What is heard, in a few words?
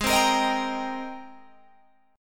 G sharp Major 9th G sharp, Guitar Open G